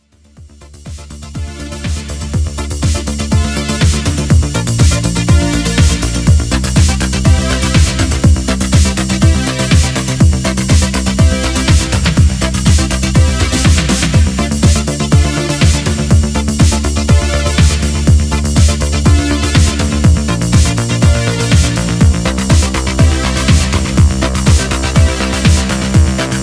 italo remix

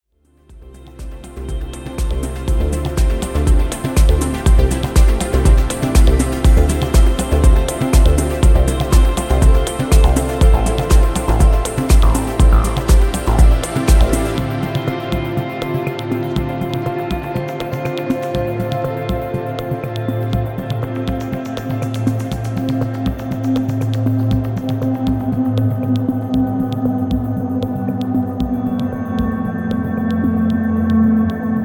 clarinets

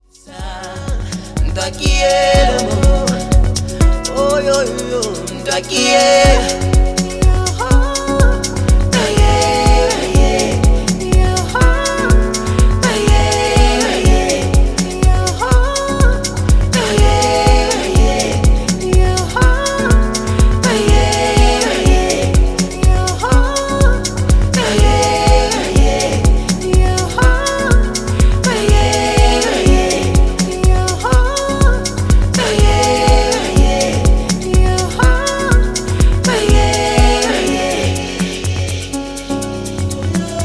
arabic piano

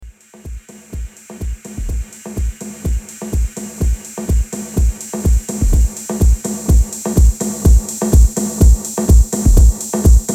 Drum

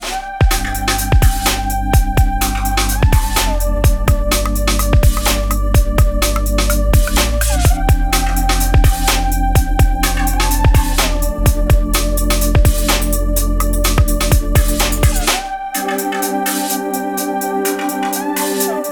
drums instrumental

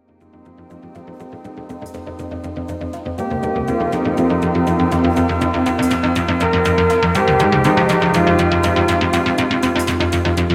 clarinets dry bridge

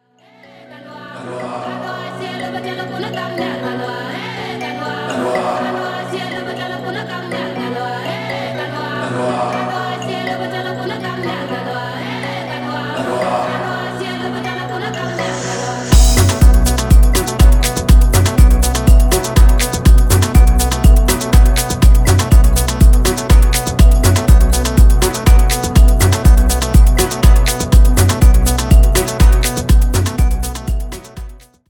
dub

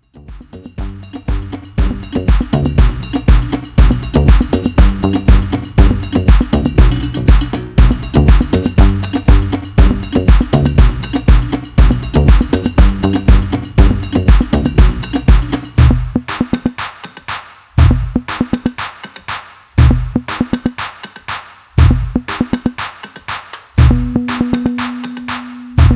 beat version

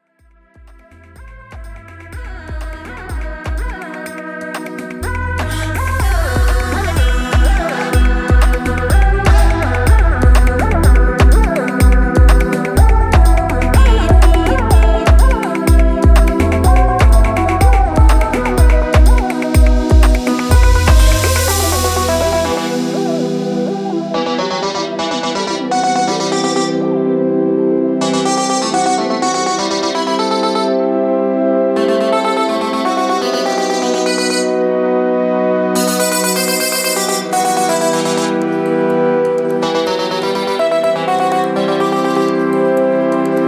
tribal dance

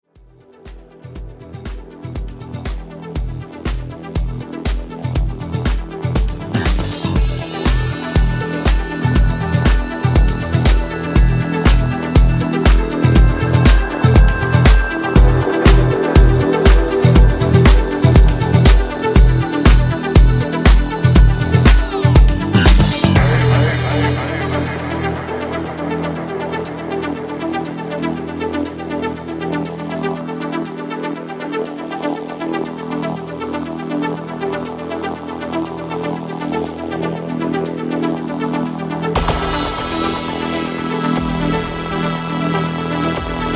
solo drummer

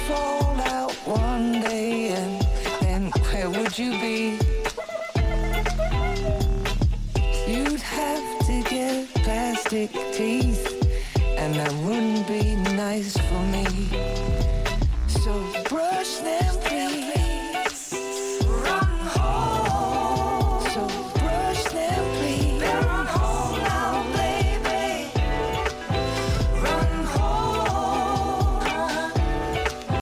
funk

